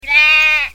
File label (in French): Chevre